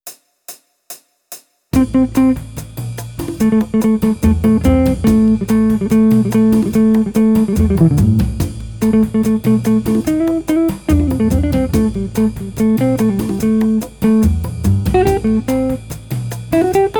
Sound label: Jazz, Guitar